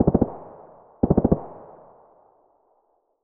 hk416 burst double; weapons; SPT Battle Ambience

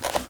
Menu Inspector Window Close; Custom UI Sounds; Resident Evil 2 (2019)

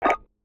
Button Click; Custom UI Sounds; Call of Duty Modern Warfare (2019)

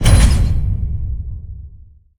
Button Click; Custom UI Sounds; Dark Souls 3

